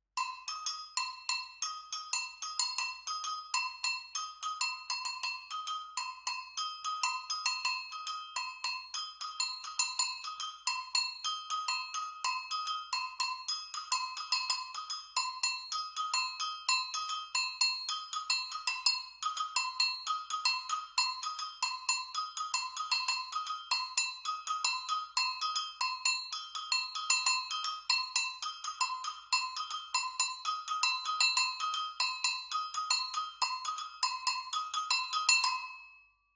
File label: agogo